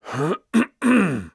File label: THROAT